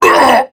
Pain Prime Grineer Male; Tau; Battle Barks

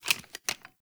Clip Foley, rifles; Snipetron

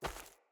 Battle Dog Walk Veg, Footsteps

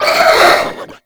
Head Shake; Warframe; Stalker

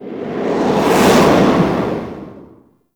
Owl Recon Transition Stinger; Warframe; Duviri

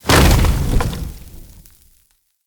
Torch Puzzle Fire Barrier Destroy

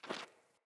Grunt Boot Walk Gravel, Footsteps, Boots; Enemy; Sinecure; Melee Grunt

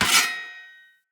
Long Sword Impact Block Defender

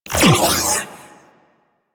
Space Fighter Railgun Fire; Space Fighter Charge Agent; Weapons

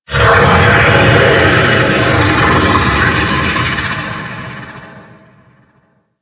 Mountain Beast Call; Warframe; Corpus

